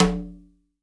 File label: CONGA, STEREO CONGA